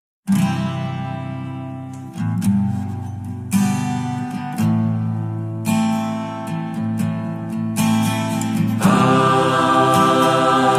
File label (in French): agnus dei messe; messe de saint francois xavier; ordinaire de messe